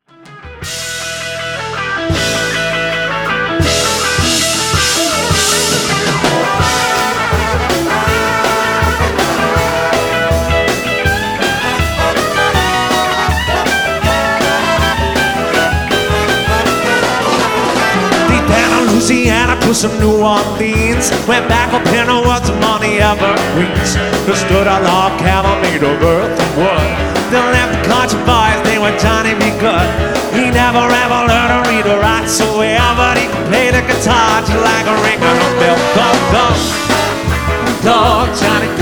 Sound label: Swing Band, Live